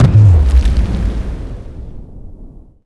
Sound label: throw whip down impact